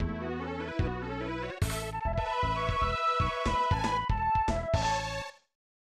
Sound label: Unused Jingle; Shinzui Taikyoku Igo Go Sennin; SNES